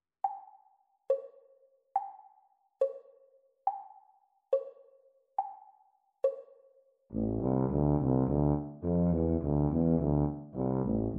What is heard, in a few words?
Bb Tuba Duet; Grade 7; practice speed